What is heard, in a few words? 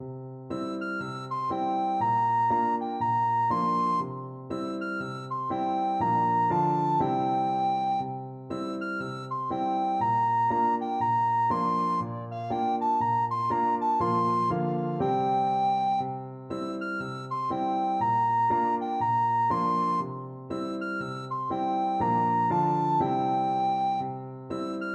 depends on the weather, recorder